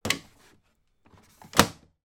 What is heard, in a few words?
household washing machine detergent tray open close; sound effects one